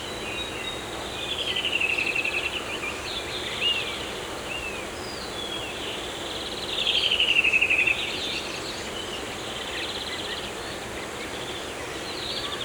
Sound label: Sounds Forest Birds (Loop); افکت صدا حیوانات; Animals